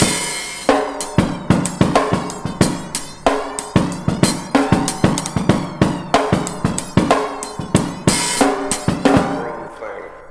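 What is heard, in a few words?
drum, Breakbeat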